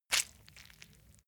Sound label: leather impact mud soft squeeze